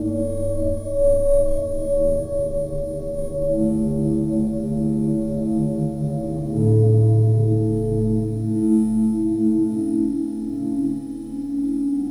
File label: Whal wav; Sonic Foundry (Sony Creative Software); Synchro Funk